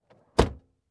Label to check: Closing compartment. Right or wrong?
Right